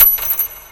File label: dime drop